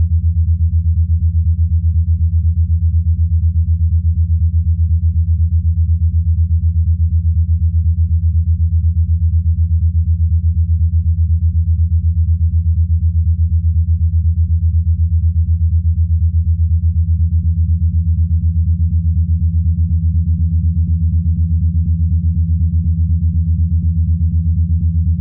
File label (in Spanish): Sonido Agudo